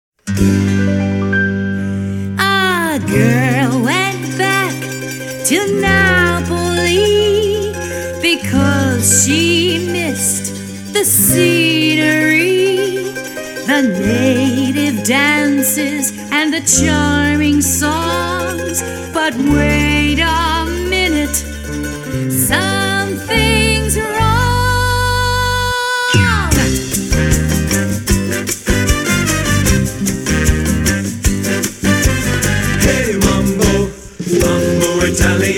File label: satb